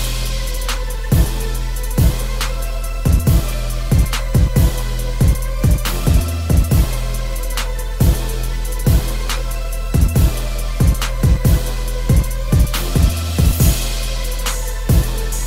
Dubstep Ringtone; Infinix

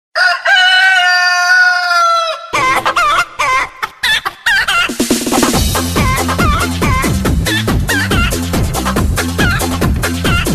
crazy chicken ringtone

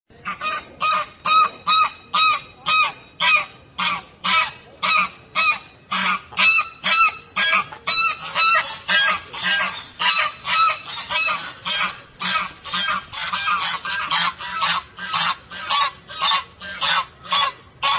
Morning Birds Sound Ringtone

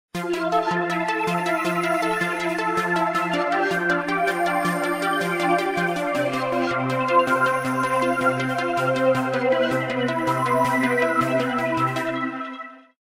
Samsung Galaxy S10 Ringtone; Pluto

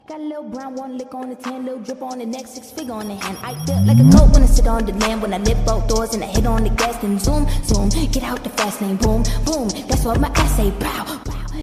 Tik Tok Ringtone

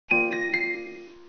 Notification; Galaxy Note20 5G

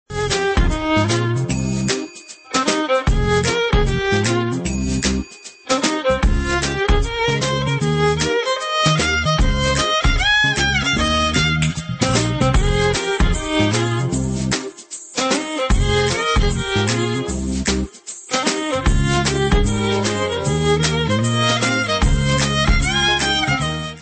Violin Hip Hop Beat Mix Ringtone

